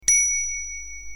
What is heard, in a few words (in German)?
Fahrradklingel Ping Messing